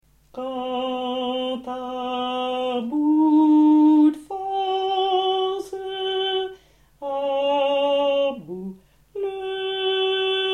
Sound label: basses